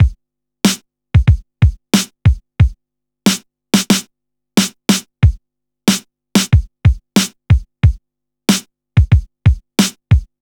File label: beat, Roots Funk; USB Soundscan vol.41; AKAI